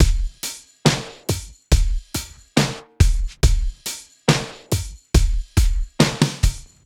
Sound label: SloMo Beats, Loops 140 BPM